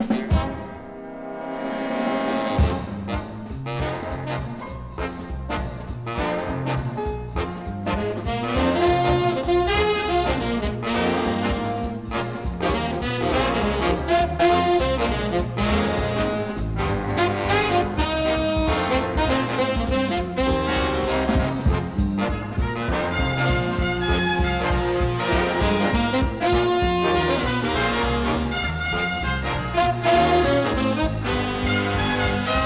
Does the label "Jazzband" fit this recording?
Yes